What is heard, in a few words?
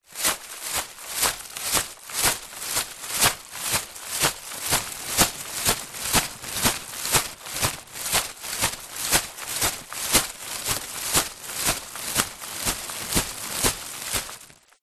shopping bag movements walking; foley